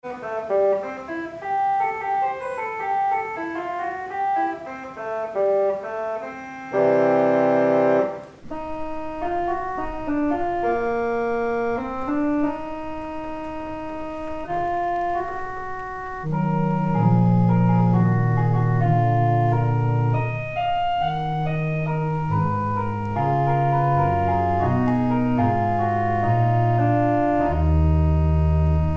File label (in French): Cor anglais